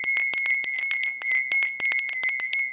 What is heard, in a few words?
bell